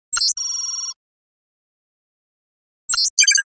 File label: information read signal; radio signals